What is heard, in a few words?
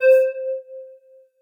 MPE Wine Glass; Ableton; Factory Packs; Lost and Found